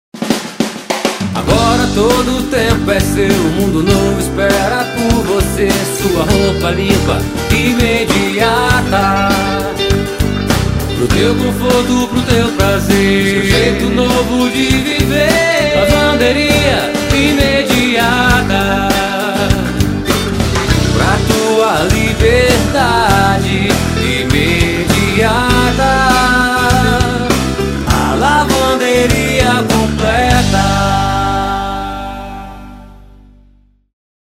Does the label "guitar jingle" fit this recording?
Yes